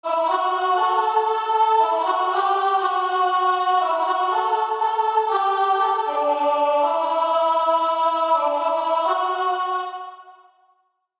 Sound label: Vox Low; Vocals – may be too low for you to sing?; Rock Band